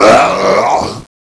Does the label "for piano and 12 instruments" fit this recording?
No